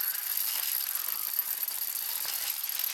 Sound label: Zipline loop